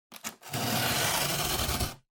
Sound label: Cutter Cut Cardboard